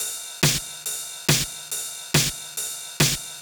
Beast Kit 140BPM Loop, Drums & Perc; D minor; Vengeance Samples

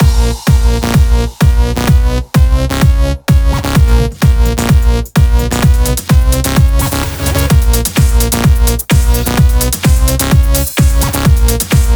128BPM Mix; Vengeance Samples; VFH1 128BPM Contradiction Kit

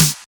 Snares; Vengeance Samples; VEH2

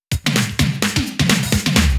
Fill 128 BPM; Vengeance Samples; VEH1 Loops

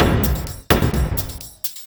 Houseloops 128BPM; Vengeance Samples

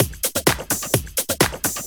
Housebeats, 128BPM; Vengeance Samples; VEH2 Loops

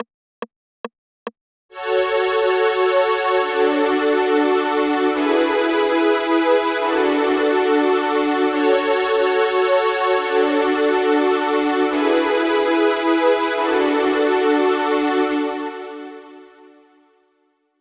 String Verse (open), 142 BPM; Vengeance Samples; Hello You

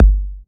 Kicks; Vengeance Samples

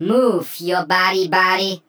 Vocal Phrases; Vengeance Samples; Vocals

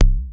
Roland E Blip, Roland S100; musicradar-synth-samples

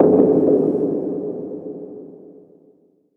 Impacts

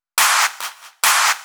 FX Vocal Guy Clap, 140 BPM; Vengeance Samples; VTDS2 Song Kits; FX Vocal Candy Guy

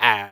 Beatbox Mixed, Human Beatbox; Vengeance Samples; Special Sounds

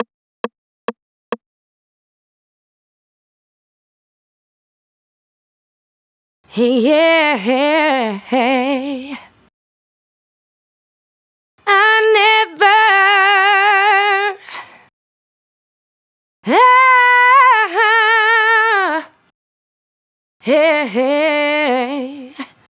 Adlibs; Vengeance Samples

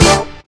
hit; PS-28 Vocal House Samples; hits

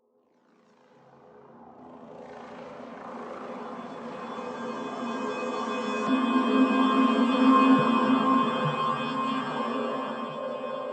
Pad; Ghosthack

